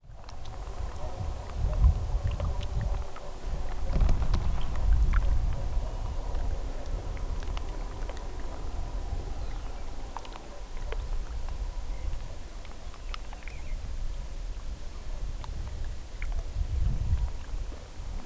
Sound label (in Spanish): AMBIENTE PLAYA; PLAYA DE CAMPANARIO